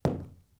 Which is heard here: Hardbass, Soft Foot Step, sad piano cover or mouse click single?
Soft Foot Step